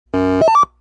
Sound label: Robot, BEEP; noisetoys